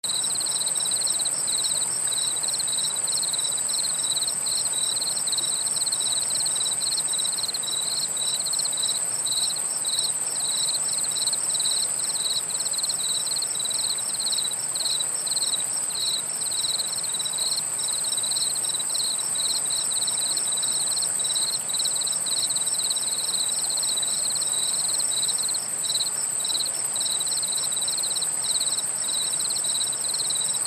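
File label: Ambience Night Insects Cricket Swamp Loop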